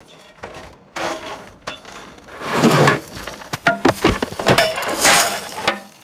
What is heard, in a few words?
rusty metal poles falling and crashing